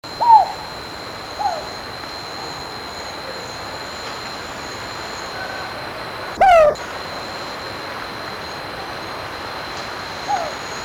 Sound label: otus lettia